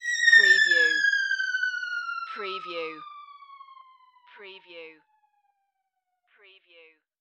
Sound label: Fall Descend